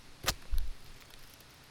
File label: leaves